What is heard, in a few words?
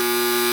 buzz